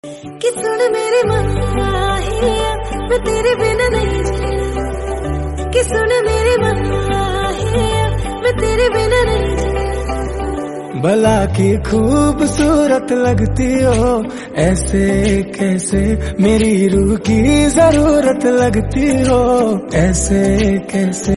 hindi

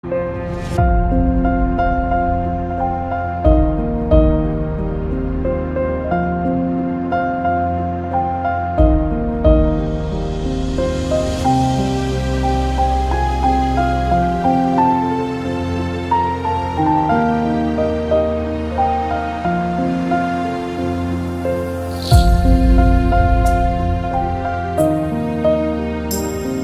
instrumental

